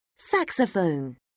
saxophone; Oxford Word Skills Intermediate

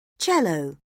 cello; Oxford Word Skills Intermediate

